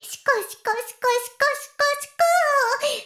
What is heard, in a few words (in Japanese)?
シコシコ音声; 古き城のドラゴニート2